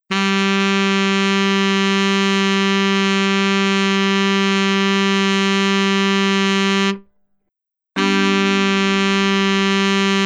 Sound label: tenor, sax